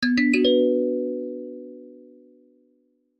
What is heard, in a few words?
match start; Zylice Skin Mix (2024)